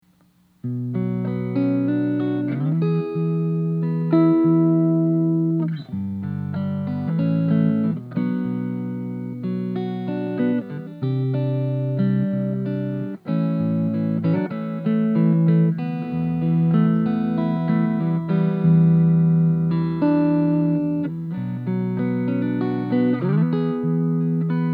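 clean neck, Clean – Fingerpicked, solid body Les Paul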